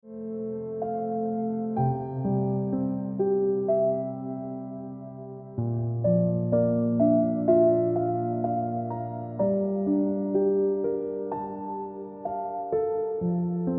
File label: Ringtone